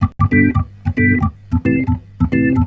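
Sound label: reggae organ G; Best Service Advanced Media Trax Modern Composer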